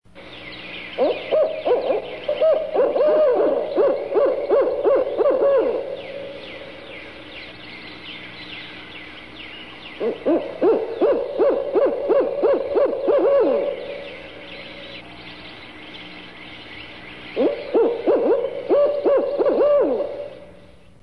Barred Owl, Owl Calls; summer

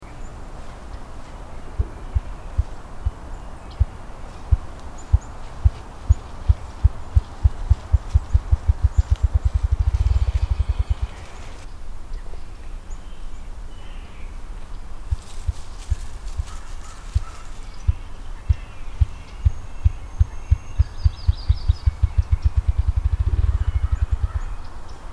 Grouse; winter

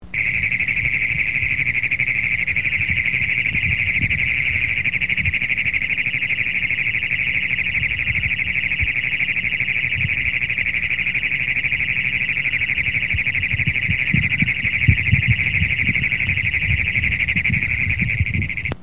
Great Plains Toad; spring

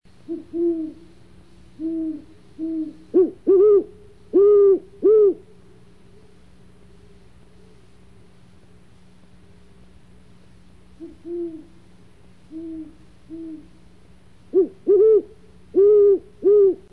Great Horned Owl; summer; Owl Calls

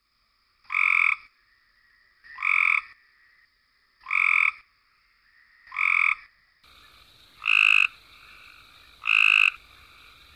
Copes Gray Treefrog; spring

